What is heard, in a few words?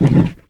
Monster Effort Small; Monster Ralph Effort Small